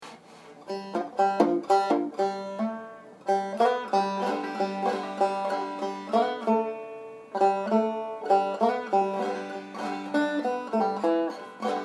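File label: slides and pull offs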